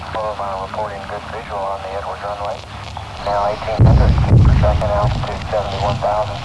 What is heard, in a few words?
shuttle booms